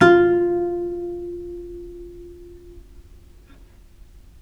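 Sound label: VIOLE PIZZICATO F2, Historical Instruments; USB Soundscan vol.23; AKAI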